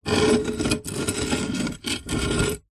household chair outside metal tube frame scrape concrete; zapsplat